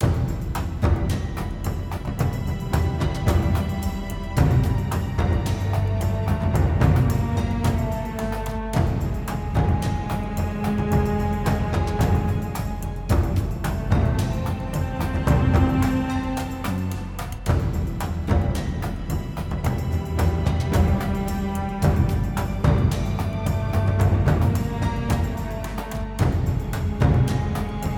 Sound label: Creep Medium